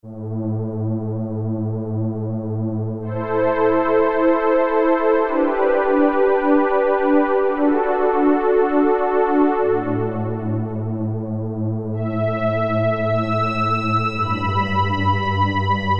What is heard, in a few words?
Strings III, Roland Juno-106; Factory Preset